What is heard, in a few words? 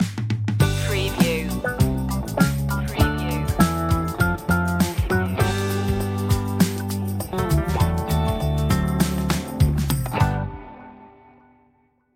easy funk comedy stinger